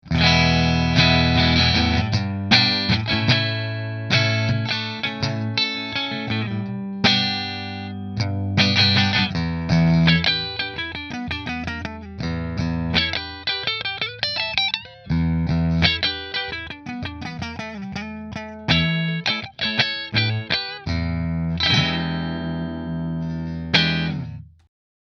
Nola Voodoo Green Neck Split Fender; New Orleans Guitars Voodoo Custom Dark Green

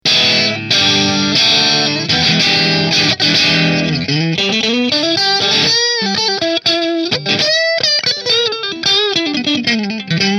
Tokai Strat Position 1 Marshall

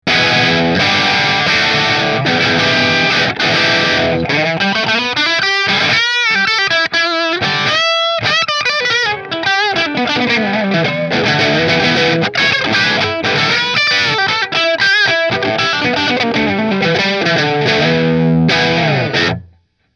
Nola JB14 Custom Bridge Middle Marshall

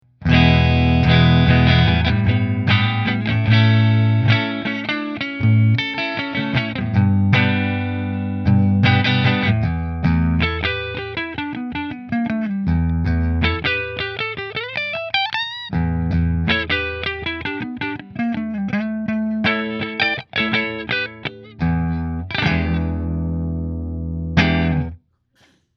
Nola Model 8 Neck Fender, guitars; New Orleans Guitars Model-8 Natural Finish

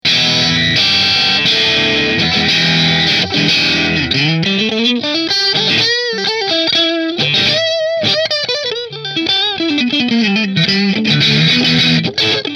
Rahan Ambrosia Position 3 Marshall, guitar; Position 2; Rahan Guitars RP Single Cutaway Ambrosia

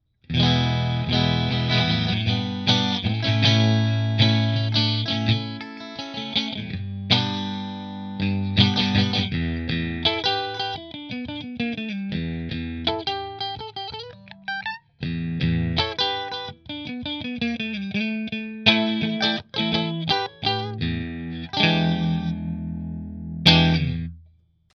Italia Position 3 Fender

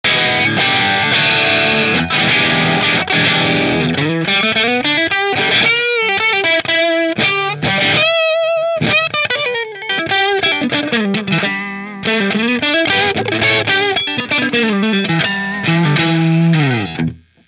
Robin Ranger position 4 marshall, guitars

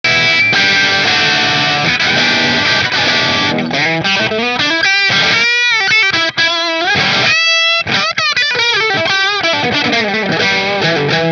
Guillford bridge marshall, guitars

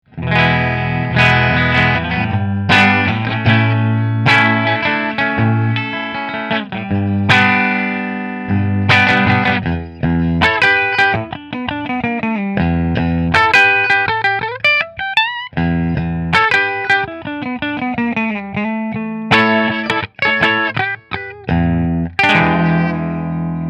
Hamer Custom Bridge Fender

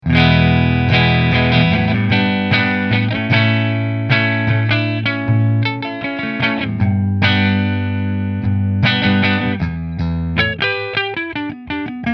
McNaught Purple Neck Fender

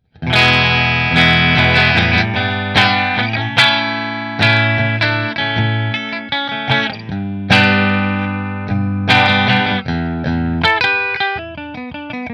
Rahan Red Flame Maple Bridge Fender

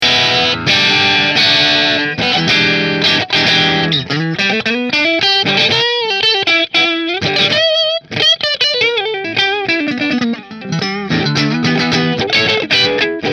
Nola Voodoo Tobacco Middle Split Marshall, guitar

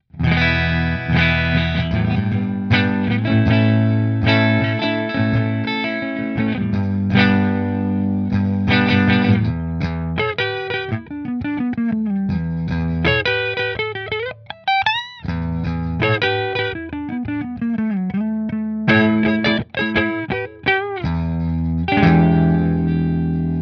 Master Neck Fender, guitars; Masters Guitars LPM Pro Cherry Sunburst

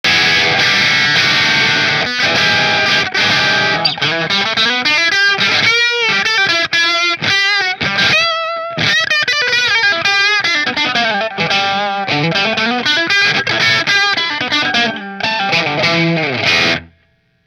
Robin tonemonger position 4 marshall, guitars

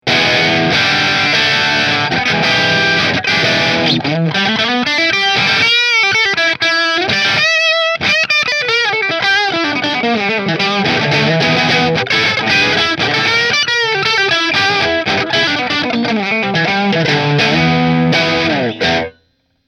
Baker Position 3 Marshall, guitar; B3 Metal XS Kerry Green

